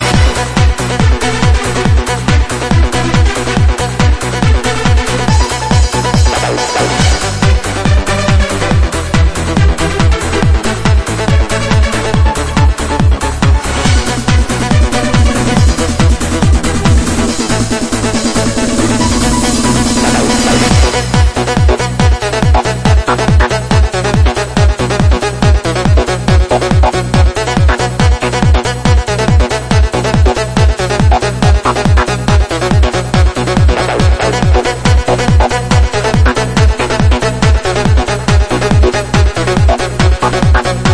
original extended instrumental mix, disco

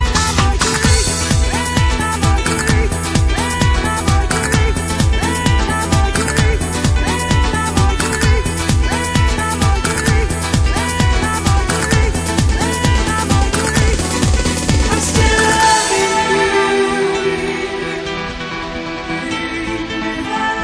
power guitar version; disco

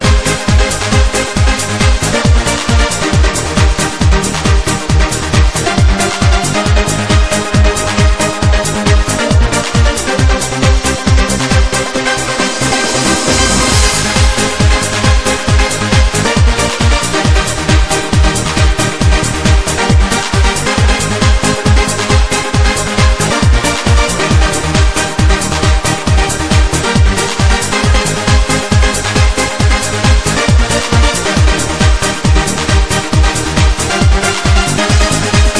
extended instrumental mix, disco

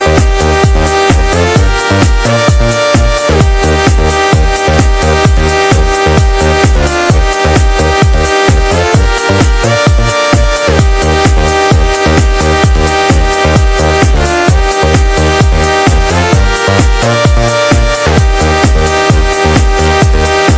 instrumental remix, disco

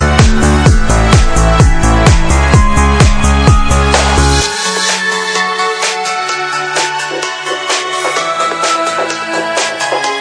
club instrumental mix, disco

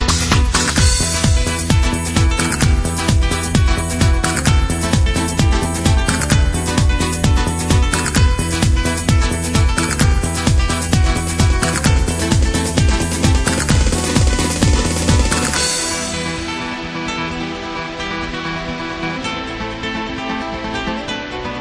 guitar version instrumental; disco

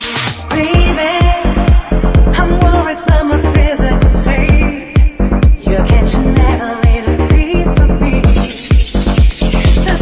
electro blues; radio edit; disco

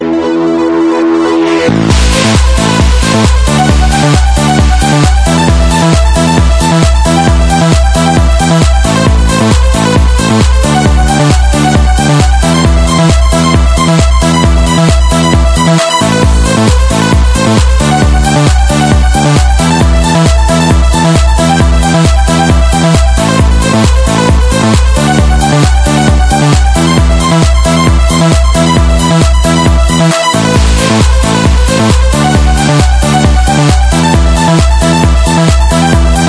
instrumental mix, disco

